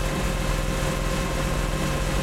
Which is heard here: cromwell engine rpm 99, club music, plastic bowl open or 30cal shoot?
cromwell engine rpm 99